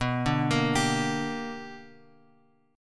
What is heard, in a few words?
B Minor Major 7th Sharp 5th, chord; BmM7#5 Chord {7 5 8 X 8 X} arpeggiated